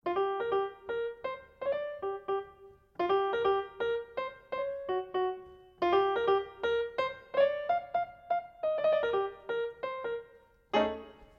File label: jazz original; umodified reference 2